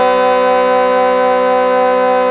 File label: Vehicle Horn Truck; Zomboid; tsarslib